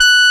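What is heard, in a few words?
horn G5, synthesizer analog horn; AKAI S6000 CD-ROM - Volume 1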